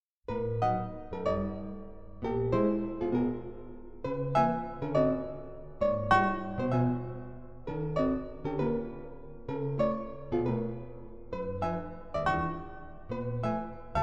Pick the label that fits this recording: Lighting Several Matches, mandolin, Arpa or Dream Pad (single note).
Arpa